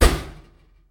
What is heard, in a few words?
ambient soundscape underground